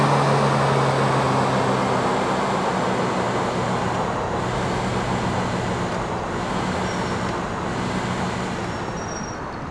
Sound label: throttle off fast, vehicles; landstalker